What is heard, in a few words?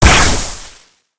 impact, weapons; ppistol